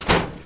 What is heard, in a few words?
Locker Close; Florida State Football - Ultimate Multimedia Experience